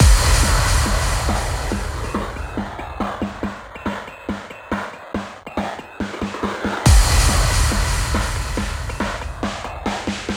140BPM Crushy 16Bars, Hands Up Trance Fills; Vengeance Samples

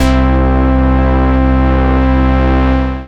Basses C; Vengeance Samples

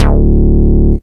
Bass D, Root D

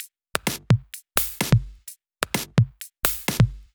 Drumloops 128BPM

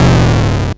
Arcade Riffs

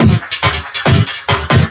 Breakbeat; Vengeance Samples; VEC2 Loops

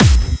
Bassdrums Hard Kicks; Vengeance Samples

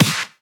Snares & Claps; Vengeance Samples

